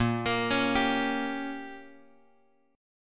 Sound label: A sharp Minor 6th A sharp, Guitar chord; A#m6 Chord {X 1 X 3 2 3} arpeggiated